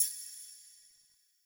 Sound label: Tablet Select; Warframe; Duviri; Easter Eggs